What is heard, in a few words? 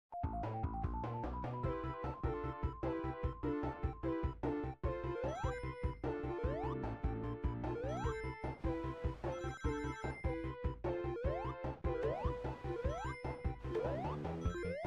Sonic game Genesis; Zomboid; Video Game Consoles